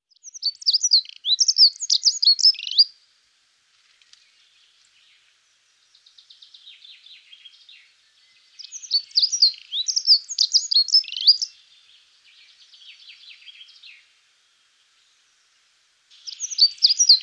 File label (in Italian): passera scopaiola